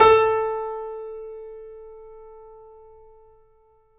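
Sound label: piano, A, right; InVision Interactive - The Piano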